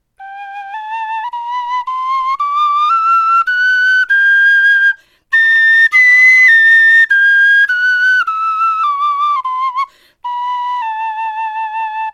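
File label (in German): tonleiter, Knochenflöten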